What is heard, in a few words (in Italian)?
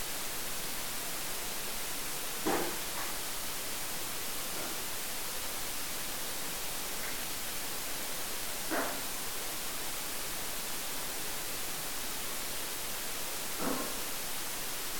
Colpi rumori; Bogoni